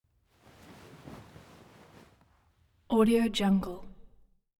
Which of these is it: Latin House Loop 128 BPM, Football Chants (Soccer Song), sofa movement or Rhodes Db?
sofa movement